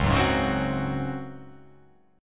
C sharp Major 7th Suspended 4th C sharp

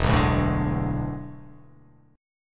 E 11th; strummed; JGuitar

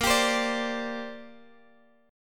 A sharp 6th Add 9th, Guitar, DADGAD; down

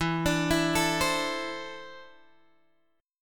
E 7th Suspended 4th Sharp 5th, Guitar, Open G

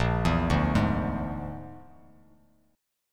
Bb-7th Flat 5th-Bb, Bass Standard, Bb7b5 chord; arpeggiated; JGuitar

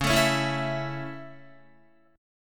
Db Minor Sharp 5th Db down Guitar; strummed; Dbm#5; JGuitar

